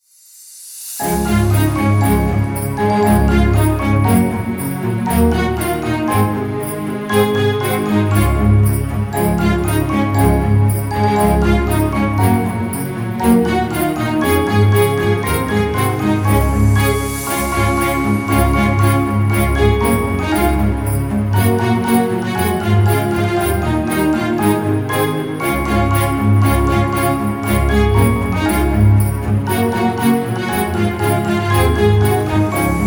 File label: jingle bells christmas; Auguri di Buone Feste - Comune di Mathi